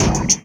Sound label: Spitter Hit, Esoterik Beatz; Spitter Kit